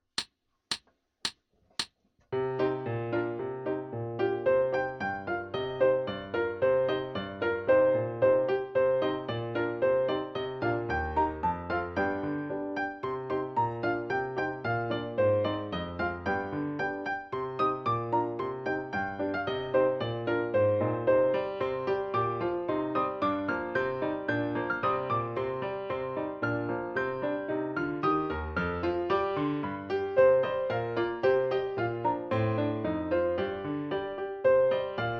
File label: Dance Full tempo with Solo, Flute